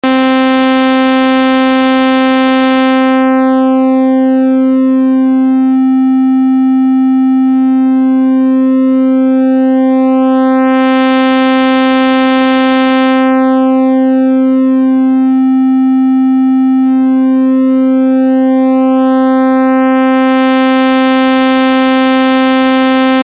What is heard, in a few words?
v-synth saw